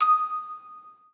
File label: musicbox